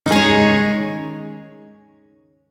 Shawzin Yo Chord B; DE; Warframe; Duviri Shawzin

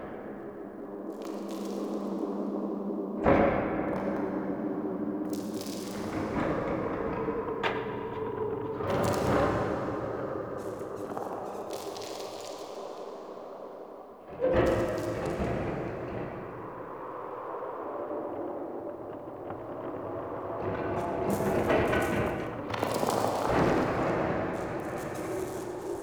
Cave Mine Amb Loop; Ambience; Midrath Island

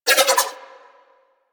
Nunchaku Spin Whoosh; Weapons; Warframe; Tenno

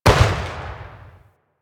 Vial Blast Shatter; Warframe; DE; Warframes; Alchemist; Elements; Ability

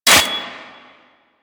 bird three scratch; Ambience

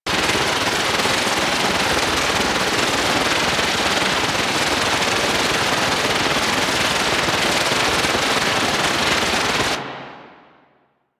Exhaust Trail Loop; DE; Warframe; Weapons; Archwing; Powers

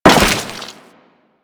Crewman Step Goo, Footsteps; Warframe; Corpus

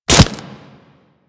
Melee Impact Flesh; Warframe; Kubrow

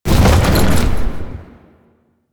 Horse Glide Wings Retract; Vehicles; Duviri

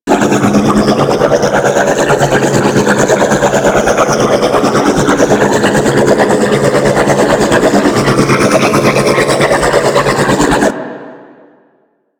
Combat Pod Idle Loop; Vehicles; Crp Combat Pod

